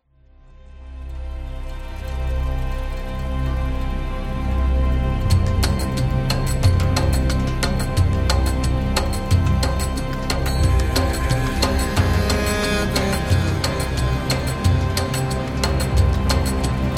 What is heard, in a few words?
Turkish